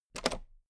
toolbox latch open heavy

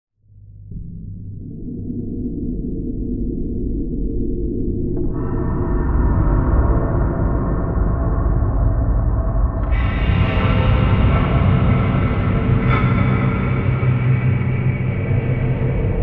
horror metallic drone

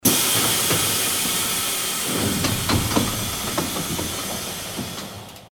tram door open and close

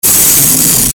distorted scraping noises

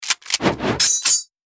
weapon

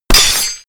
sword hit flesh bone

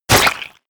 hit blood heavy

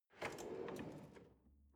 drawer large draw

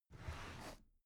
drawer cabinet carton open regular halfway

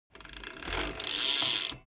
bmx bike pedal once freewheel brake short

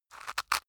rice grind

